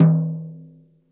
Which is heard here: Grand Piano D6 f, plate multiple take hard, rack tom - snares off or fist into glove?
rack tom - snares off